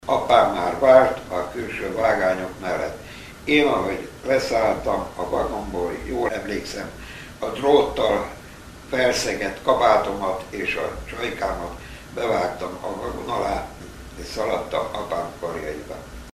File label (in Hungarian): Duduc